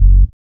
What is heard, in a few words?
BASS; E-Lab; X Static Goldmine 1